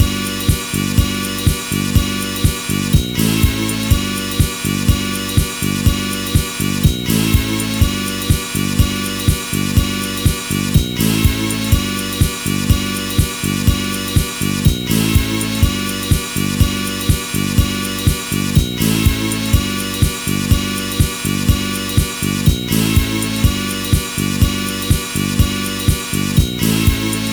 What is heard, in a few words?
HI BASS; Song Deconstruction